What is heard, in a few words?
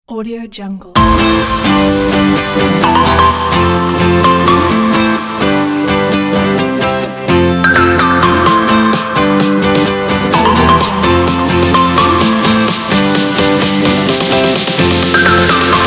energy positive pop